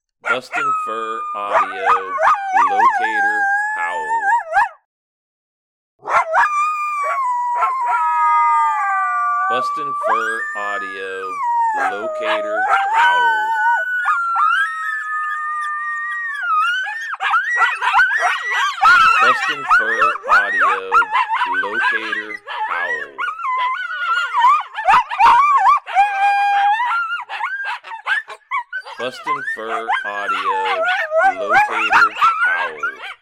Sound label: Locator Howl